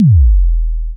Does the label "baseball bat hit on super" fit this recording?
No